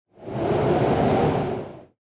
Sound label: wind; Dystopia; injection